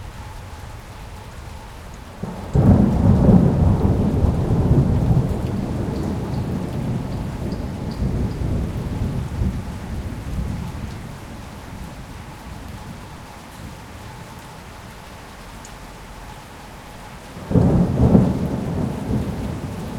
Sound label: storm; Apotheorasis